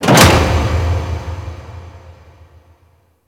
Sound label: Gas Storage Container Stop; Warframe; Corpus Gas City; Ambience